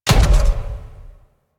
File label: Mech Step Run; Warframe; Vehicles; NechroMech